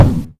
Terrain Wood, Collision Sounds; opensim; CollisionSoundsAssetSet

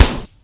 Plastic Flesh, Collision Sounds; opensim; CollisionSoundsAssetSet

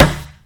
Wood Rubber, Collision Sounds; opensim; CollisionSoundsAssetSet

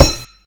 Wood Metal, Collision Sounds; opensim; CollisionSoundsAssetSet

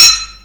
Metal Metal; opensim; CollisionSoundsAssetSet

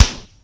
Flesh Plastic, Collision Sounds; opensim; CollisionSoundsAssetSet

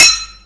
Metal Glass, Collision Sounds; opensim; CollisionSoundsAssetSet

